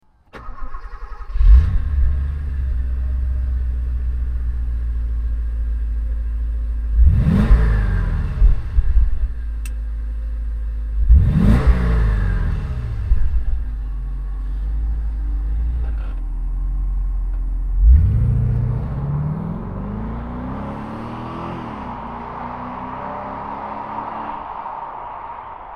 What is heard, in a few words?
Porsche 928 GTS